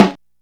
Puffy Steel Snare Drum Sound G Key, snare drum sample tuned to the G note; snare drum samples